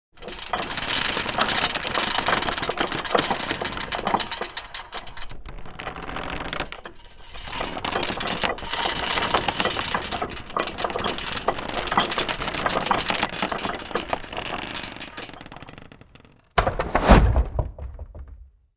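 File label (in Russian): catapult cocked, projectile launched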